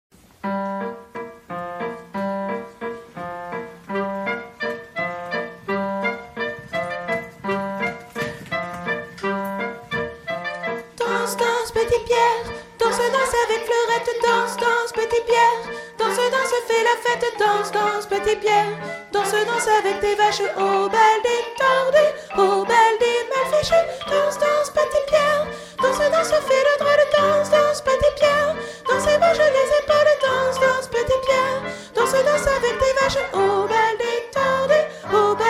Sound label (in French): danse voix